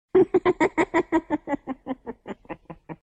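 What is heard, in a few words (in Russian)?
жуткий смех сумасшедшей бабули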